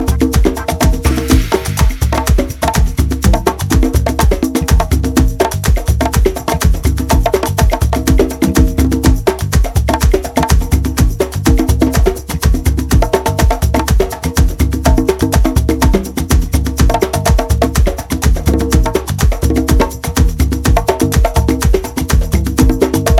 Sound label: Bass Organ Dub